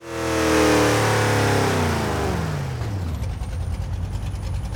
throttle off slow, vehicles cars; dod charger 70; lwcars

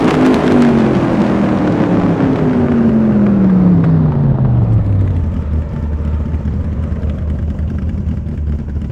slowdown, vehicles cars; lwcars

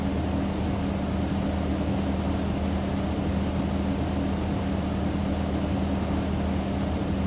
idle, vehicles cars; lwcars

